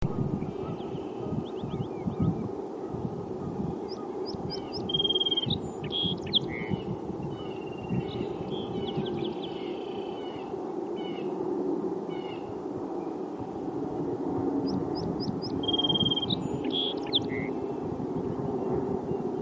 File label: Song Sparrow